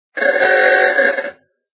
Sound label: horn; tootoona